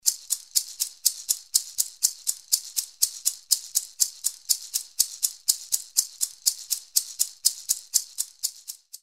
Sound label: maracas; lateinamerika